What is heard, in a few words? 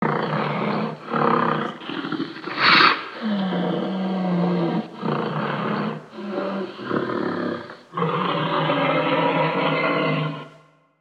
Wood boar